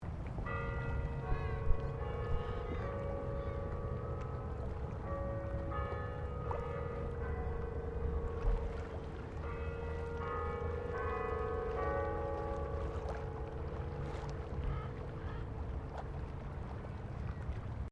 Carillon Canberra quarter hour chime distant; Carillon in Canberra; Bells; Musical

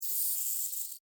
Insect Hiss Angry, Insect, Hiss, Angry, Bug; Animals:Insects; Insect Hissing; Blastwave

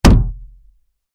Impact Wood Hit Hard; Miscellaneous Impact

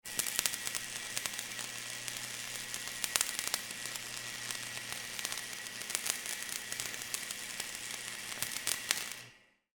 Wire Welder Spark, Industry, Wire, Welder, Spark; Machines; BlastWave

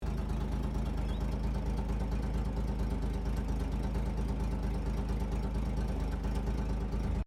Car Holden Fe 1957 Idle General Loop; Classic Australian cars; Vehicles

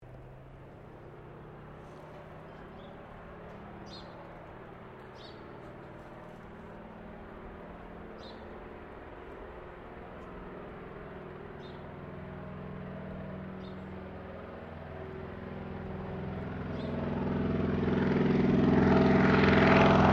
AIRCRAFT CAC WIRRAWAY TAKEOFF; CA-16 Wirraway; Airplanes; Vehicles

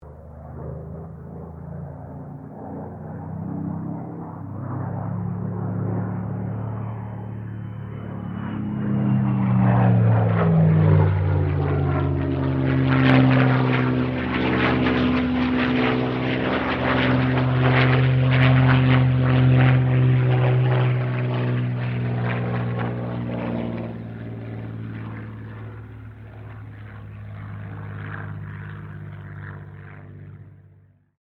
AIRCRAFT SUPERMARINE SPITFIRE MK-XVI PASS; Airplanes (Sound Effects:Vehicles)

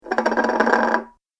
Saucer Fast Vibrating Wobble, dishware; Household Sound Effect; Home, Household, Restaurant

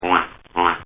DUCK Two Quacks; Birds; Animals; Ducks; Partners In Rhyme

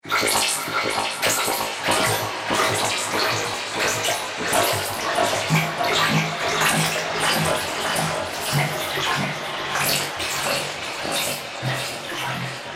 Drone, robot; The Droids Are Restless; Robot Sound Effects

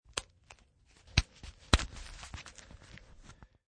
Unbutton Metal

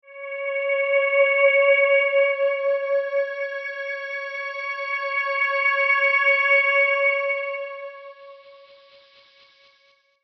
Alien Musical Sweep; Alien Sound Effects; science fiction sound effect

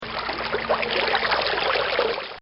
Stream small; Rivers (Sound Effects:Nature); This sound effect was recorded in a custom built sound booth

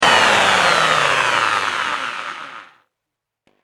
Electric Drill Sound; Hand Tool Sound Effects

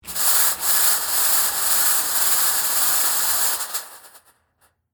Smoke Machine, Theatrical, Stage; Film Sets; BlastWave

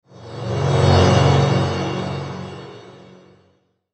Whoosh Low Slow Airy Shimmer; Blastwave; Whoosh Sound Effects

